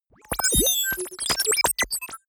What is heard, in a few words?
confirm glitch, signale; soundpool